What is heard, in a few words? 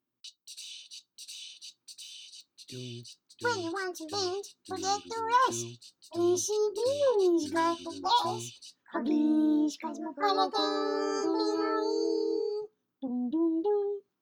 Jingle; ACBeanery